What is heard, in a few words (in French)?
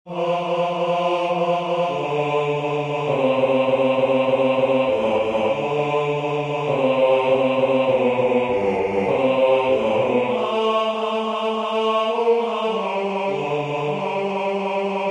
Chante en mon coeur pays aimé Bass